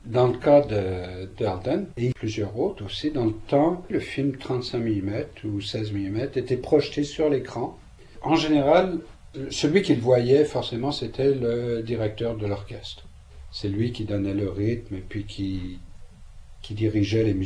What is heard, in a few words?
orch; Joutel